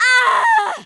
death

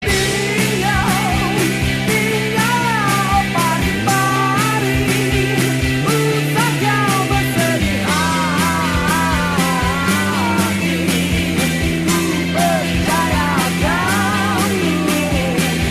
Chorus

